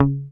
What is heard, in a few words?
HOFNER MUTE, Bass